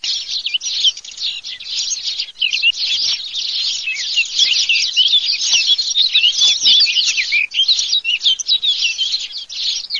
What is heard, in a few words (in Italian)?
CAPINERA PASSERI